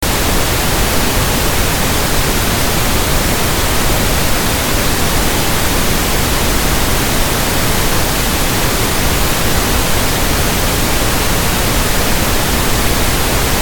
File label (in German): Rosa Rauschen; 30 sek. Laufzeit; Ongsiek Haustechnik